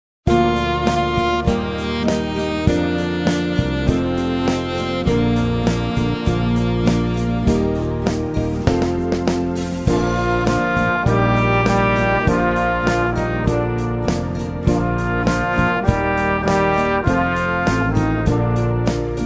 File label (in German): trompetentaxi